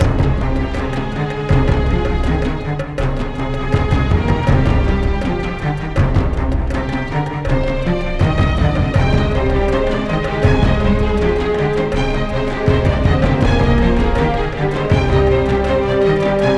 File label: Low Combat